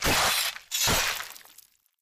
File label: dragon boss attack; Skillzgaming; battle royale desktop